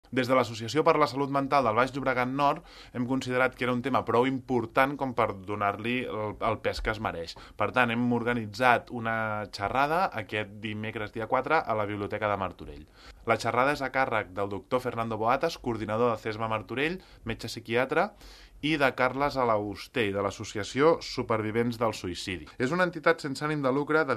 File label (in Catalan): Trilles